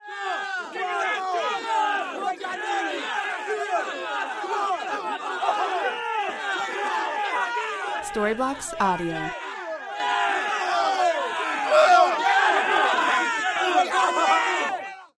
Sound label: crowd loud scream instigate cheer bar fight